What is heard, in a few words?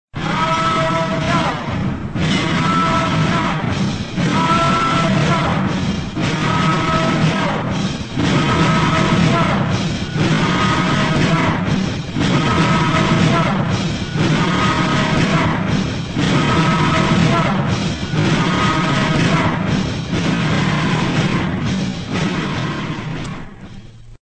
chant